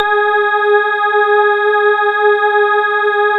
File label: CUSTOM G#4, CUSTOM ORGAN